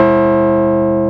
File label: CHIME; Analog Odyssey